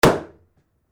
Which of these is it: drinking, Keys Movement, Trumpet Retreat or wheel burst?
wheel burst